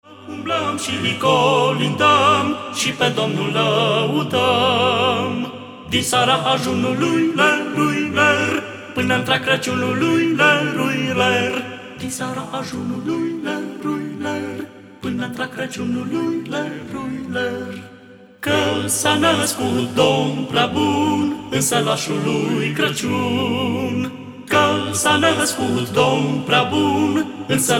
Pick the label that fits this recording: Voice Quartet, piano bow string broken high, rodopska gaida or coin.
Voice Quartet